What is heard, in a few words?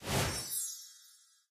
place Furniture